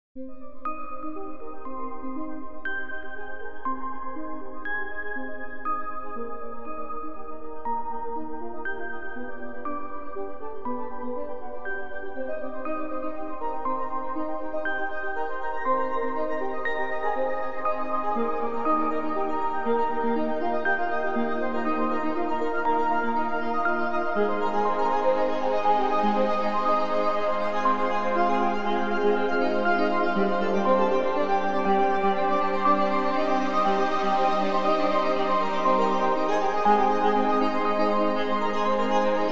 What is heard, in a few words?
multilayer arp; Dune2